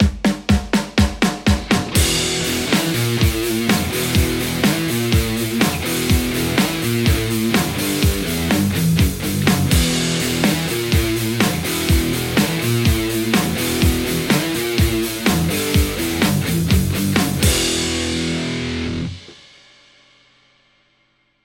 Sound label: Les Paul